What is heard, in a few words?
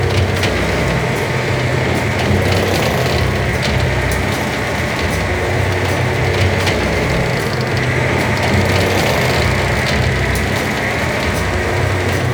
Broken Door Large Loop; Ambience; Props; Warframe; TheNewWar; Zariman